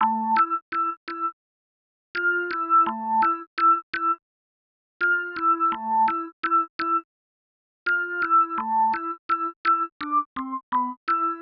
HipHop Synth Amin; Digidesign; Pro Tools Essential Loops

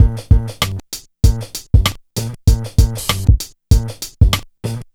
097 HipHop Combo F# Maj; Digidesign; Pro Tools Essential Loops

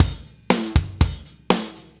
120 Solid Rock Drums, Fast Tempo; Pop Rock; Digidesign; Pro Tools; Pro Tools Essential Loops

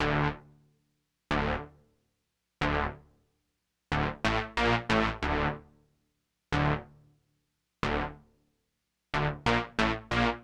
HipHop Synth Dmin; Digidesign; Pro Tools Essential Loops

